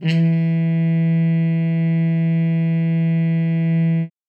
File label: HARM E3, TRUMPET; Session Instruments; Best Service ProSamples vol.42